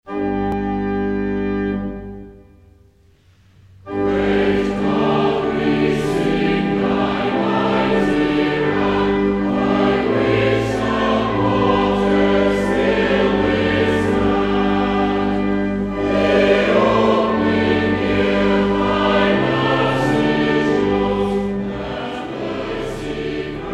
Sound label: Choir, hymns